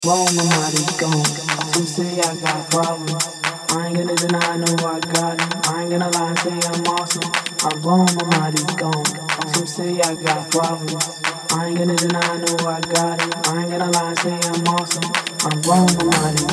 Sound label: Blown